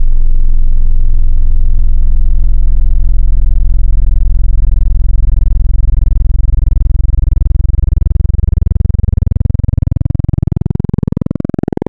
Analog PWM Sub, wavetable

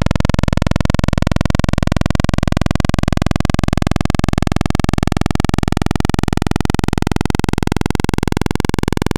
Analog PWM Custom, wavetable

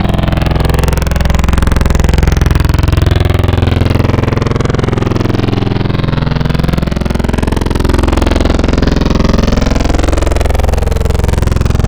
Growl, Wavetables

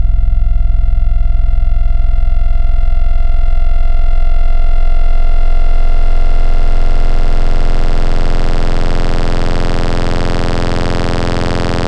DISPENSE wavetable; Neu KatalYst

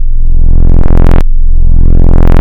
squrounded; Adventure Kid

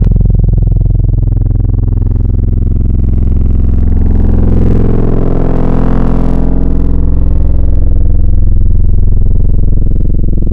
FM, Wavetables

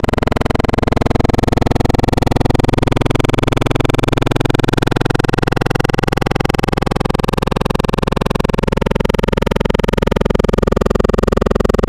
Growl, Wavetables

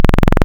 saw bright, wavetable; Adventure Kid

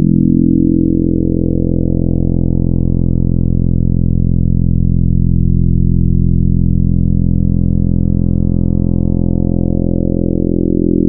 FM, Wavetables

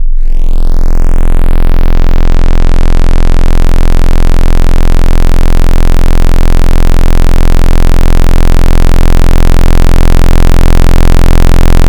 Dist Zero Square, wavetable

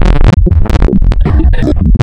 granular wavetable 2048; Adventure Kid

